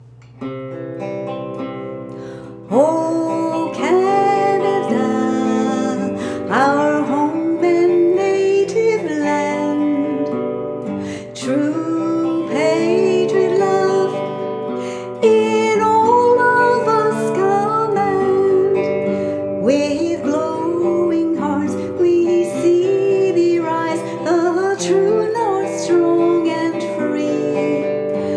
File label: O Canada English